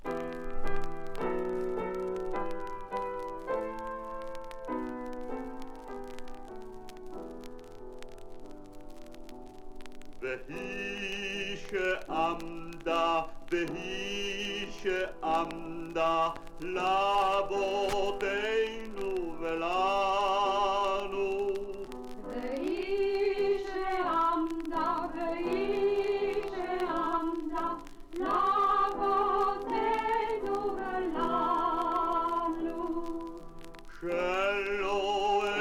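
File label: Ashkenazi chant of the Haggadah; vehi cheamda; ashkenaze chant de la hagada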